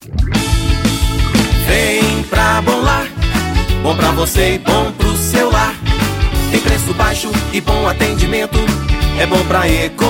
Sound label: jingle; bom lar